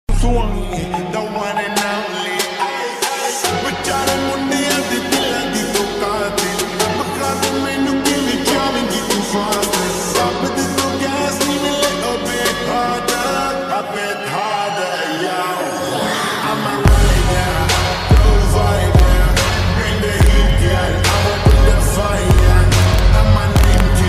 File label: most romantic ringtone